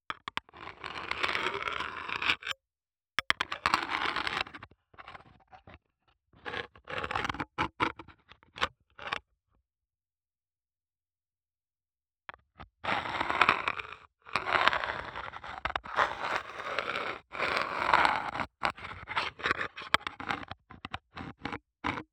contact small metal box lid scrapes with metal muted; mono; SoundHolder